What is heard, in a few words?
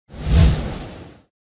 woosh